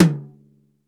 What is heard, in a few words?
BEAT KITS; Crypton - DrumBase5000